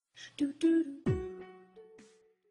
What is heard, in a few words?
dubstep remix